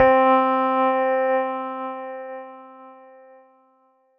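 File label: Ensoniq ZR-76 3 Layer Electric Piano C4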